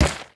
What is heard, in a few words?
stone hit